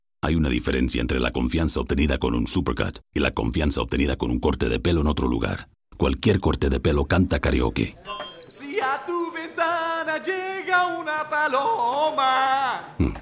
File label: Karaoke; Supercuts